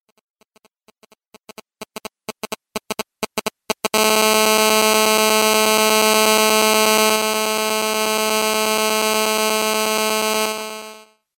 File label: funny tone; children